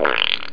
Stomp; Bugs Bannis; Squish 2.6.8

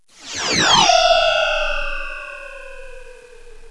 wired space, space atmo; Terra Sound Library; xlarge

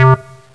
bass; Descent

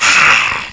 frog die; Ferazel's Wand

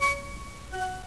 Invitation; Outland; outland-chess

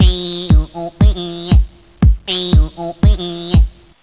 breakbeat; instruments, beats; World of Sound

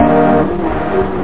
Urban chord; Instruments

